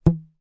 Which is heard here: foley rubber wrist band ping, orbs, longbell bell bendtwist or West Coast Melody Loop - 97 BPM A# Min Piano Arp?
foley rubber wrist band ping